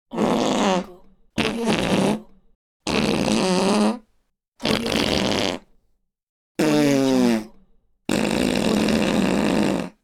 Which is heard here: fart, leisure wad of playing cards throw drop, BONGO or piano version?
fart